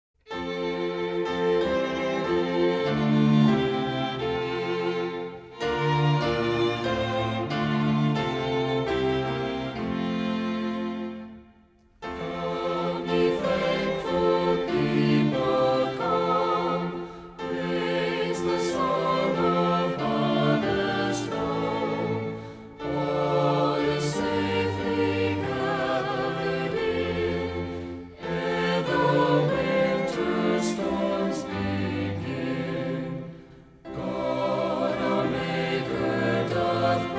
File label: come ye thankful people vocal and instrumental, hymns